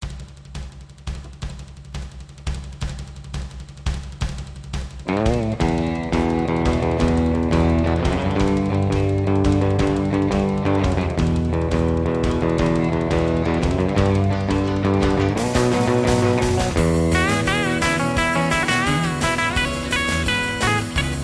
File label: Driving Guitar